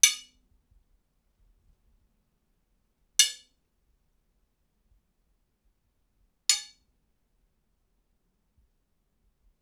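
3 hits iron bars in courtyard rec from foot of stairs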